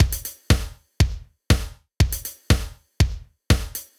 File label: Beat, Old Skool House, 120bpm; House of Amnesia; Big Fish Audio; OSH Construction Kits